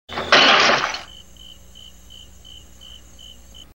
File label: pot breaking